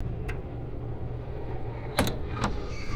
heavy metal airplane latch clunk and squeak; aircraft

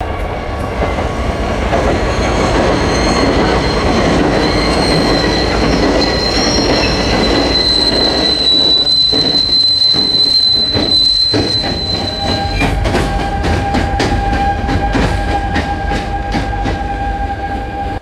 train slowing down with brake squeal

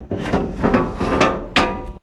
clunky hollow metal shifting rattle

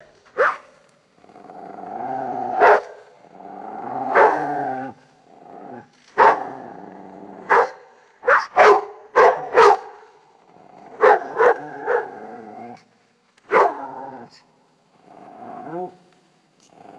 distant dog growling and yapping; animals

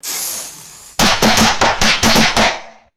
small firecracker pops inside metal can; fireworks

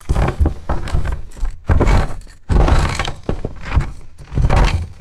subtle concrete and stone scraping movements

